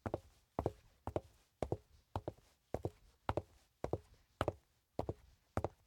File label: Footsteps Pumps; LOOPERS